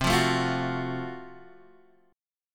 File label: Gb Minor Major 7th Flat 5th, chord